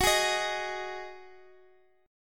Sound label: Eb Minor Double Flat 5th